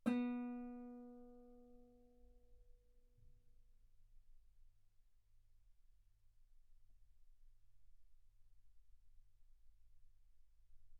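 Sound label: Guitar ff B string B3; sul B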